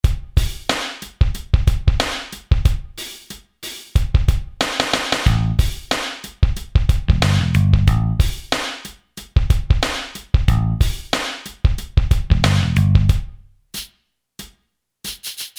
ROCK HOP, Boss DR-3

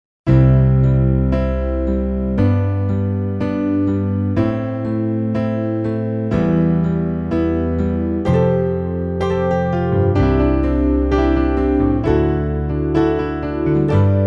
80th Stack

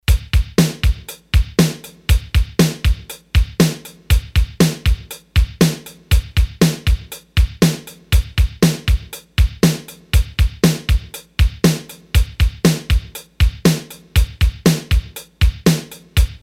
8 Beat, Roland D-10; Rhythm Demos

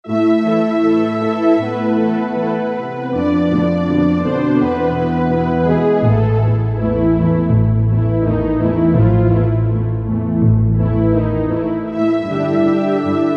Orchestral, Korg MSC-4S Orchestra; Korg MSC-4S Orchestra 1

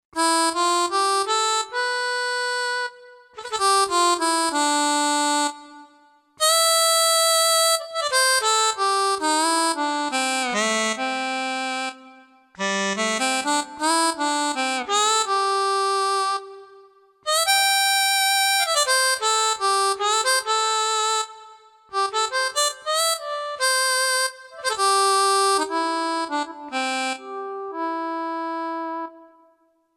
Harmonica

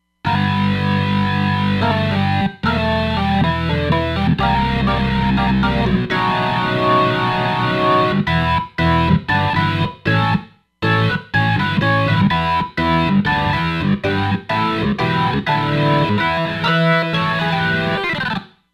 Smokey Water, Roland Fantom XR

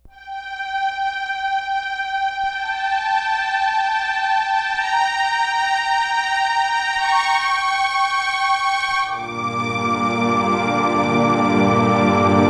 CELLOS VIOLINS

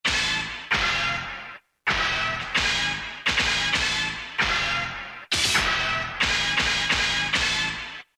Club Hit x4; Roland M-DC1

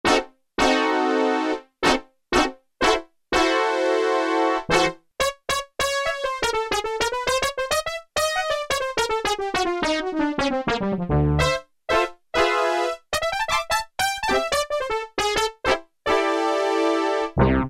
BRASS QUART, Casio VZ-10M; Internal factory combi

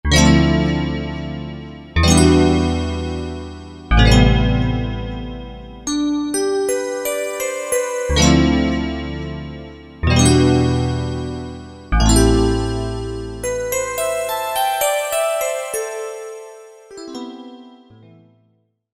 STACK EPF

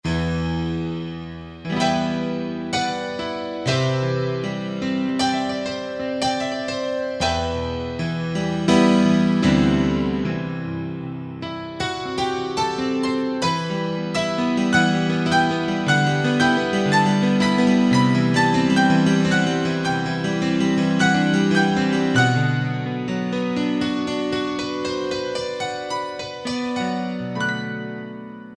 Piano Stereo Piano, Yamaha EX5R; Factory presets

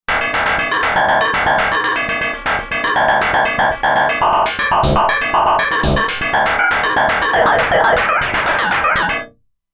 FM Waves, synth

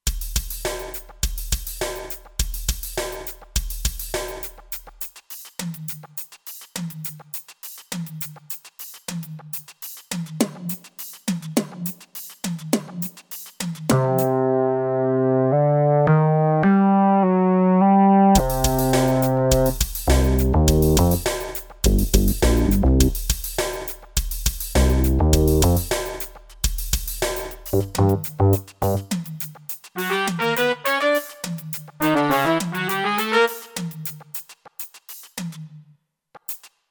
C7 F7 G7 Groove

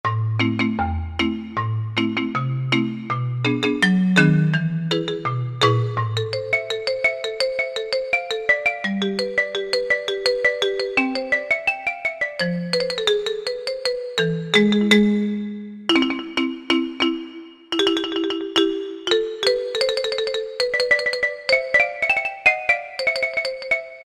Marimba, Korg T3

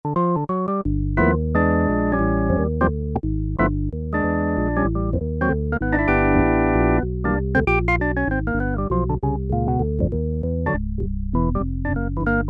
JAZZ ORGAN

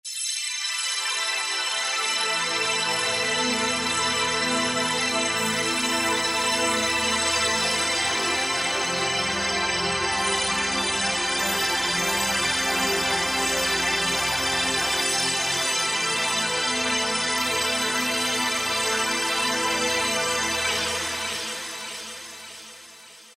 Yamaha TG500 ME mello

